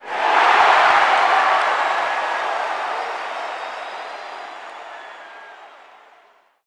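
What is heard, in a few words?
Basketball Crowd Cheer; SFX Kit; Crowds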